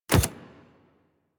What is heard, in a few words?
Step Default, Foley Footsteps, Pets; Warframe; Zanuka; Zanuka Companion A